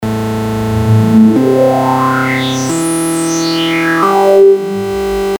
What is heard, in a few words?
eq test freq